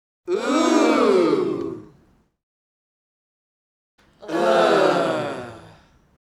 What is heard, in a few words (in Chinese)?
拟声Oooo!、Uuh(男声); renlei